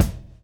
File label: 115 groove; AMG Gota Yashiki Groove Activator